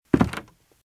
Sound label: Footsteps - Wood - Creaky - Walk - Left